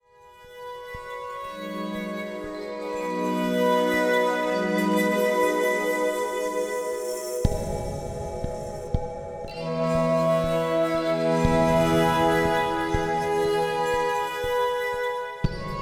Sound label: Binaural Delta Theta Gamma Waves